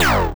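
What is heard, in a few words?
enemy shoot; horizontalrunner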